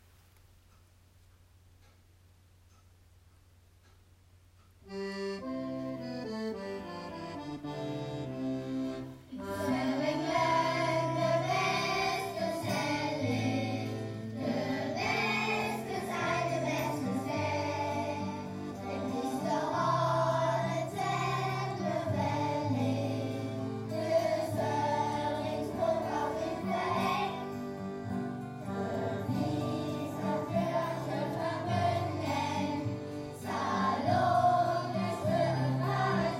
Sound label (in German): Nationalhymne Biike; Sylter Osten; Soelring Foriining; Expedition Uthlande